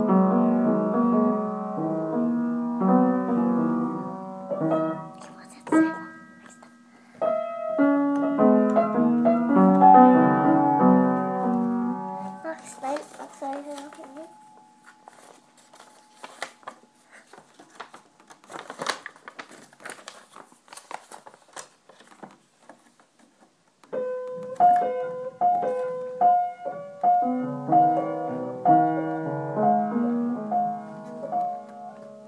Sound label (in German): konzertante hausmusik